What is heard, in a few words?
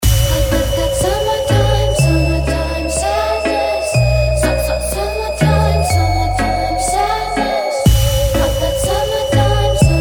trap remix